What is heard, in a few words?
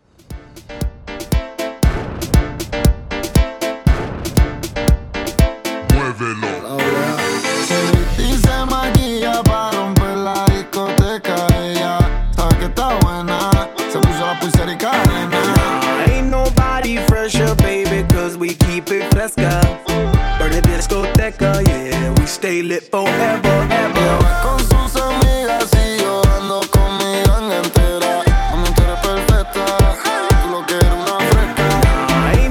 Party 118 Bpm; djyan